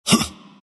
grunt; dota; ember spirit

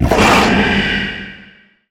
leap; weapons; dota; mirana

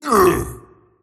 pain; tiny

